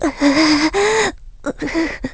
Whimper; Talo whimpering inside the Kakariko Sanctuary

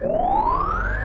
Mirror Shield Absorb Loop; Zelda; OOT

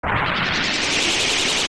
Fire Charge; OOT

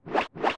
Boomerang Fly Loop; Zelda; OOT

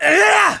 Back Slice; Link's Back Slice (roll-around attack)

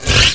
Ice Block Slide; A block of ice sliding

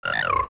Frog

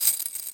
Hookshot; Firing the Hookshot (Young Link's grab)

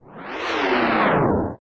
Shake; Morpha

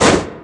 Strike; Zelda's basic attack noises (weakest to strongest)

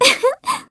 Giggle; Zelda giggling; Skyward Sword

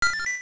Beehive Poke; Tarin poking the beehive

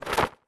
Drop; Dropping from the sky after flying; Zelda

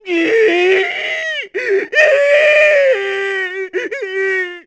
bawl; Maggie's Father

